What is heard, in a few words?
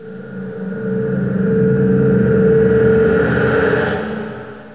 metallic; quake2; mnhcoop